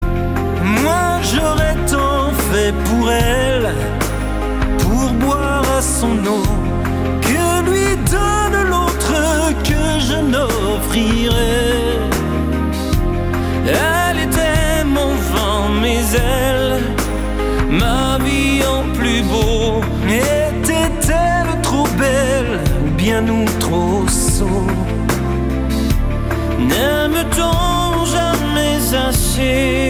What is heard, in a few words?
piano